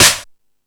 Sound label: Rap Session, E-MU Pro-Cussion